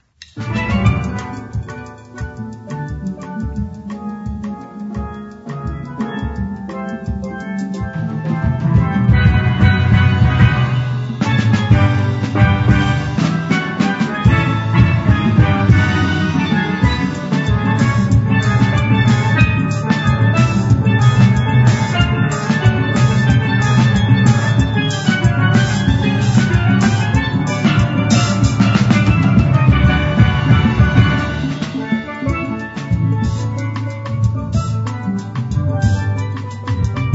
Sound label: Calypso; Tourist Leggo